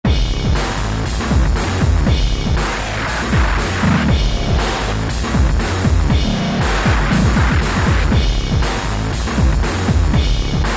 Digital Punk, Korg ES-1 Factory Patterns

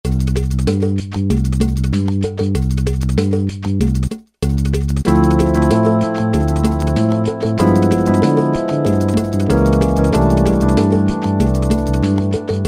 Dub Samba, Alesis Z7 Latin

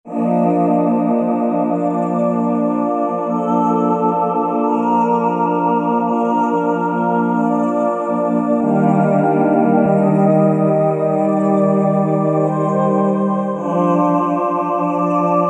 Road-Vox, Roland V-Synth; Factory Patches

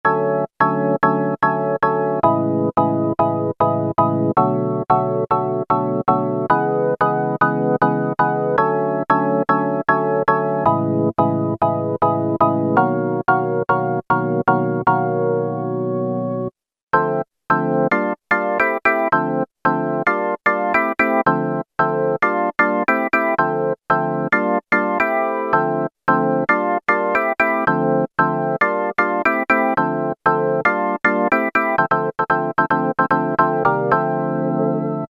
House Organ

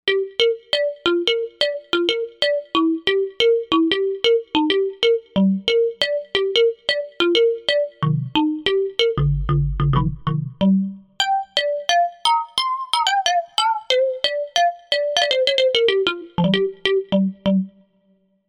Novation UltraNova; Factory Patches

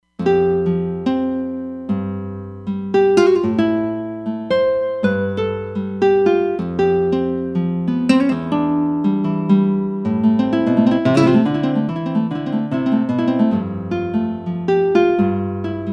Nylon 4way

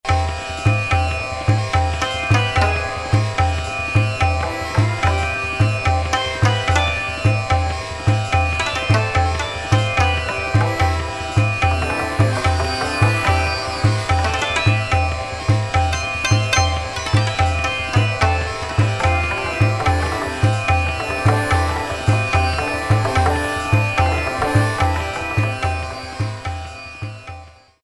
Rhythm, Indian Inst; Korg DSS-1

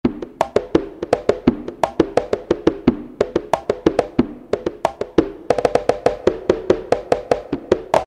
CONGA; Latin And F.X. Percussions; Roland SN-U110-02

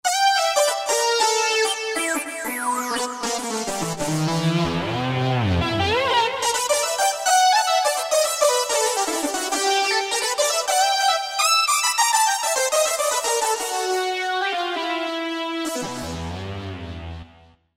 Saw Lead Mix; Factory Patches

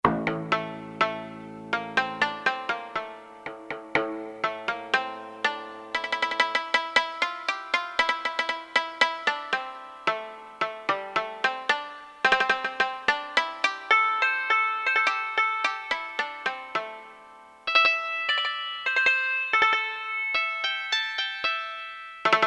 Kotar, Japanese Inst; Japanese Inst 2

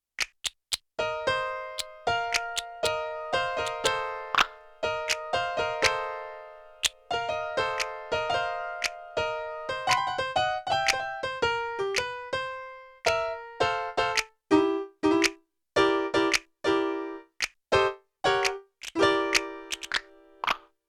Piano Snip C2 Split; Yamaha TX16W; Jazz Performance

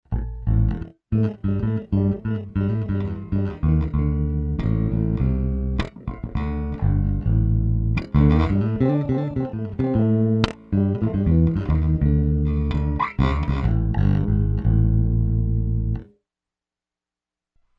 OCTAVE UP, Zoom 506 II

